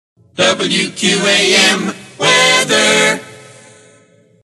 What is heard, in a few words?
Moog; WQAM; Gwinsound